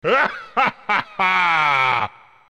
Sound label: win; wario